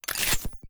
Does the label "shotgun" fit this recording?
Yes